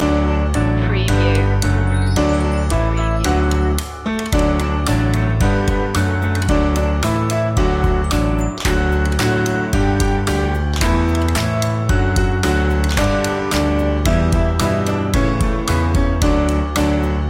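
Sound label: bingo instrumental loop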